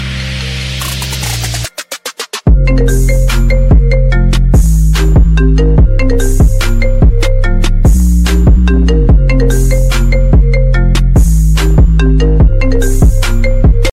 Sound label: iPhone trap remix